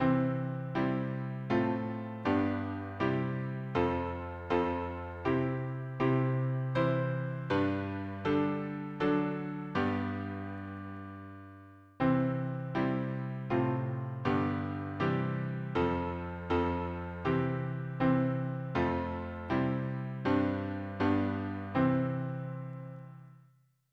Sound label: hymnary harmony piano